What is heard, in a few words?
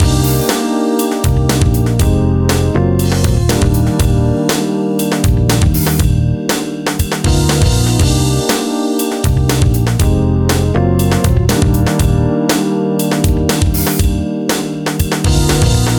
Loop